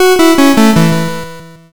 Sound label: gameover